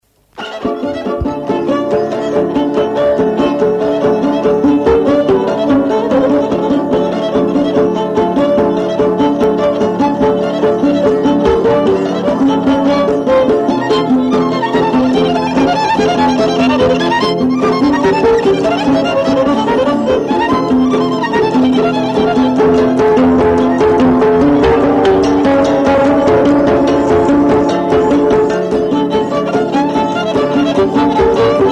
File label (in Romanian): Sapte gaste taraf; jocuri si cantece batranesti; Vaslui